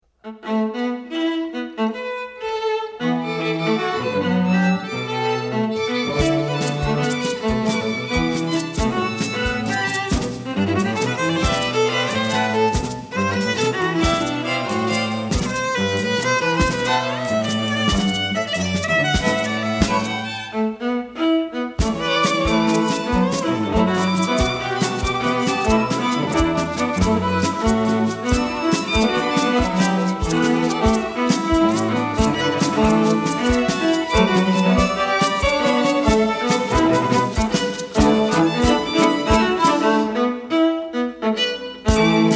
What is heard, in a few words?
String Quartet